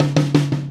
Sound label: Tom